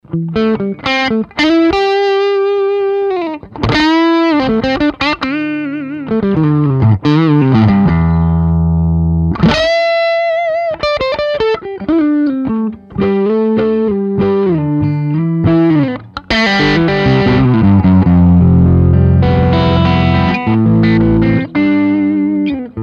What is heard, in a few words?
GTS90 solo blues